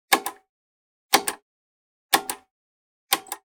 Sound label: Generic Button Metal Medium